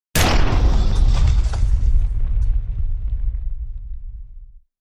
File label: Impact Explosion; soundscrate